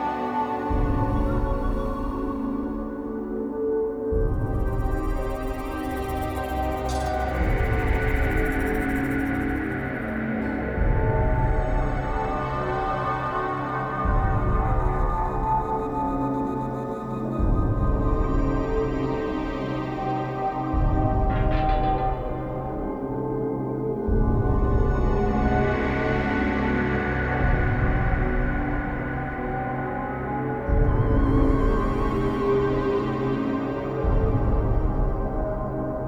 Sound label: music space; freelancer-hd-edition